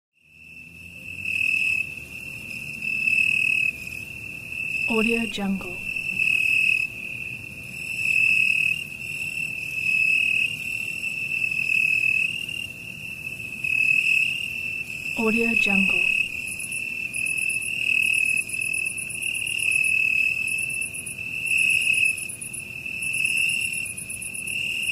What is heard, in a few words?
summer night crickets; almira23